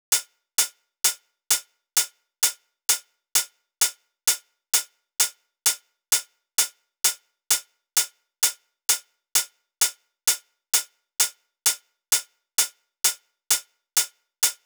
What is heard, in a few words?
Drum Loop - 130 BPM Hihat; Loop Stems; Cymatics; DOPE DRUMS VOL. 1; Steam